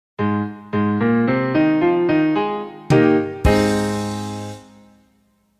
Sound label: Establish A-flat Major; McGraw-Hill; Establish a Key